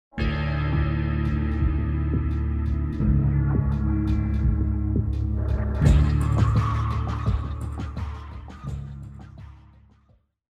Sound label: logo music; Counter-Strike Global Offensive; valve